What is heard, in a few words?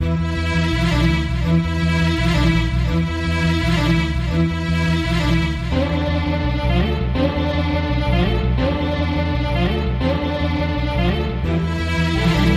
Strings and Bass